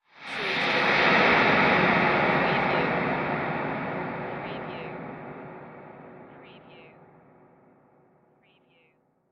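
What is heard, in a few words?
Production Element Airy Reverb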